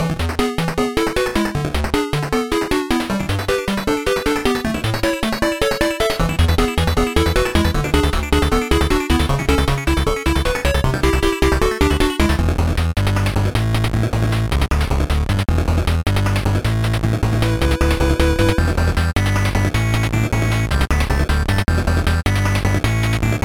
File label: SMS Demo; Super Audio Cart 2